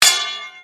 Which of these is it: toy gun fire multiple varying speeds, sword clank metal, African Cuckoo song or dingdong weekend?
sword clank metal